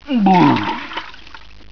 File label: VOMIT